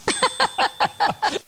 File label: Ding laugh track